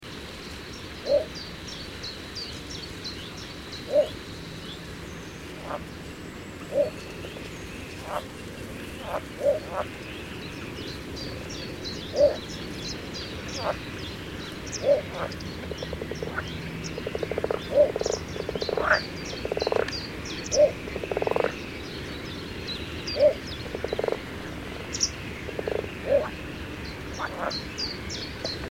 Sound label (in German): Zwergdommel; 25.05.2009, 31er, Rieselfelder Münster; Jan Ole Kriegs